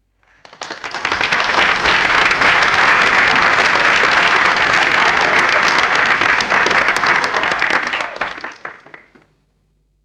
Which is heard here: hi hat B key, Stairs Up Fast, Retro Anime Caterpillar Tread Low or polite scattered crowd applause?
polite scattered crowd applause